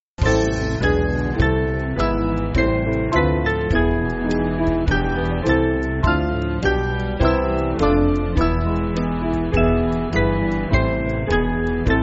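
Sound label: Band, Backing Files for Churches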